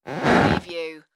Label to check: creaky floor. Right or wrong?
Right